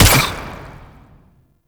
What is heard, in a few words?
Infested Burst Rifle Shot 2D, weapons